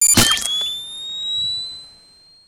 medkit infect; weapons

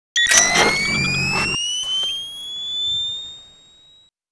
detpack timer

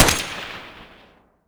nailgun fire; weapons

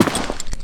jump, footsteps; movement

